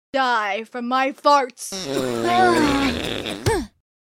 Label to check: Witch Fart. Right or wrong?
Right